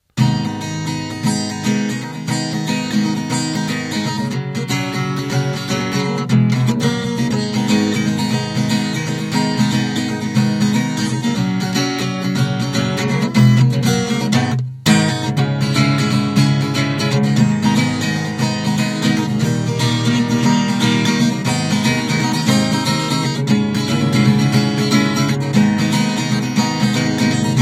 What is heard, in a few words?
guitar; Soundscape Overhaul